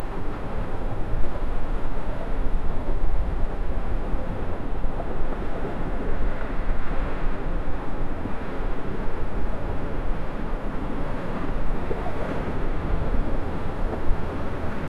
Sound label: a boat running in the; boats